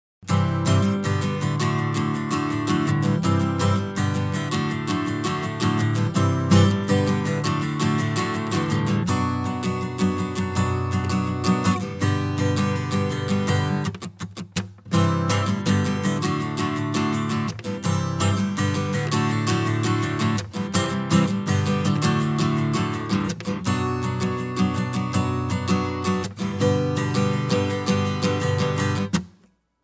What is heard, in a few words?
High End Preamp